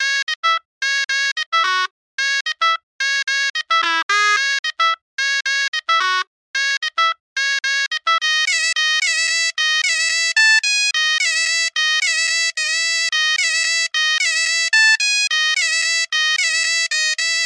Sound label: Baraat Shenai Loop BPM 110 E; Gully Loops